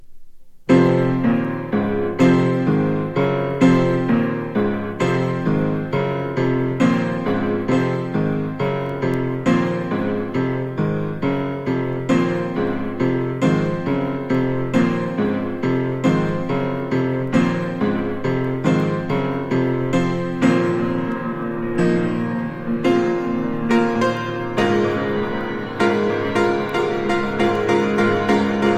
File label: piano solo and grotesque piano trio